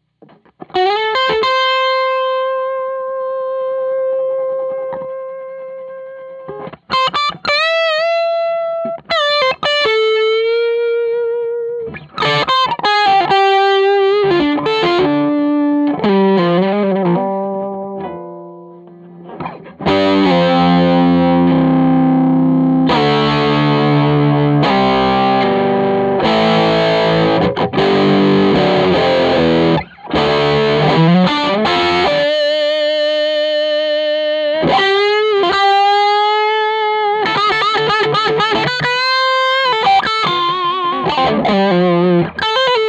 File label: 335 OD Feedback; Trinity OSD Amp; ODS